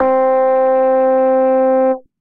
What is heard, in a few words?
Keys